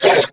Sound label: Pain Female; New War; Narmer Grineer